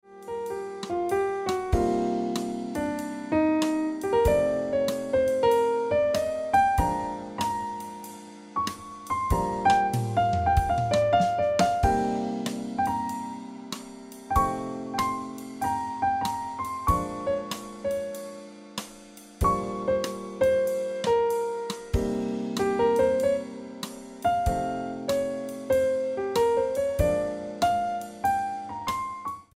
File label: Ringtone Piano Music